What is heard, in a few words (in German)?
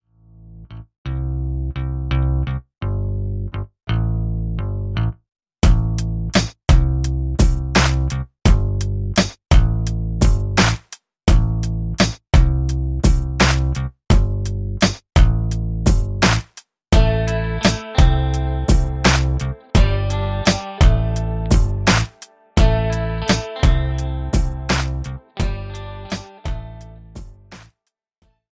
Raetsel Track Instrumente Ausschnitt; Musikstile in Pop & Rock